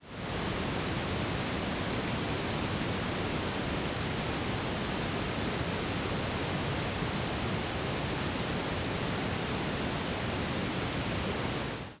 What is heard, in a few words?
static hum